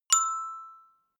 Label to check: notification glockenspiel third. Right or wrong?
Right